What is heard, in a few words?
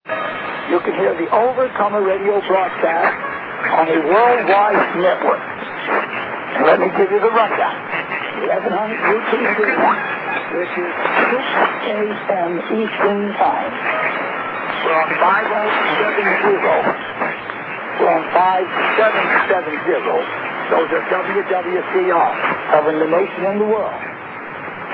5985 KHz; OCRB